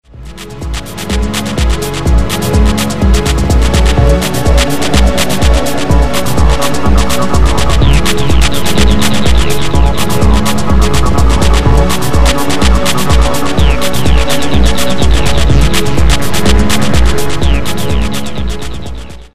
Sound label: WATER